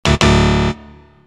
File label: section fail; osu